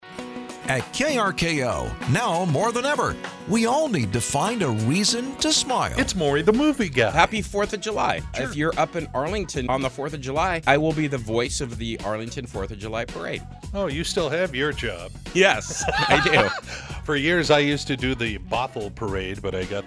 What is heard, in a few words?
morning laugh